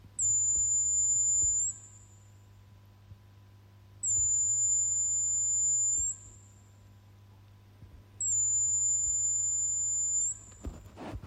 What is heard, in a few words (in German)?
ox pfeifen, Universal Audio OX Amp Top Box